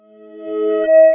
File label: Scan Complete Sound; cdr-diag